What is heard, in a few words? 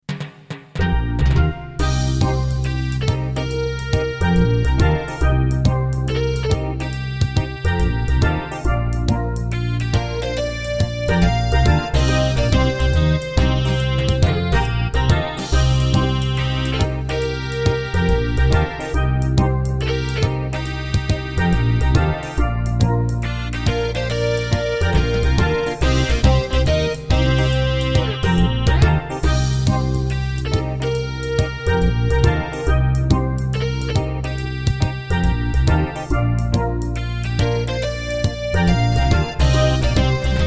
Terratec MiniWaveSystem WT64; Eco Quest